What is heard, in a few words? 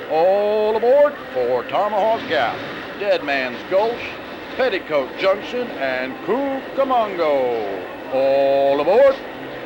Train